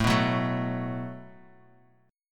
Gb Minor Double Flat 5th A, guitar

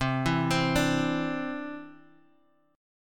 C Major Add 9th C down Guitar Half Step Down

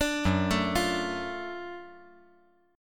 F sharp Minor Major 7th Sharp 5th F sharp down

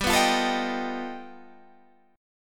G Augmented 9th, Guitar, Standard

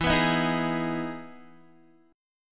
E Major 7th E down Guitar DADGAD

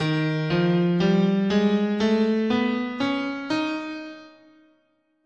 D sharp Major Locrian, guitar, scale

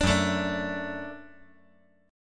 F sharp Minor Sharp 5th, F sharp, chord; chordshape; down

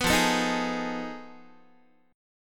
F 7th Sharp 9th Flat 5th F down Guitar; F7#9b5 strummed

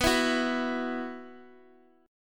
B Suspended 4th; x,11,12,9

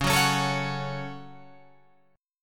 Db Suspended 4th Sharp 5th Guitar Open F; strummed

